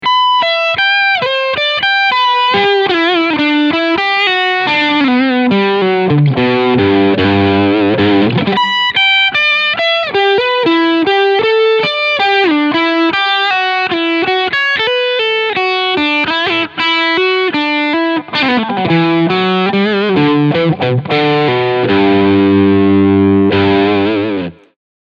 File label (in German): Steg PU Crunch